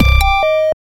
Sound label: 8bit sound; mugen number muncher